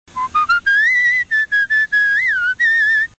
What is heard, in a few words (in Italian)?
fischio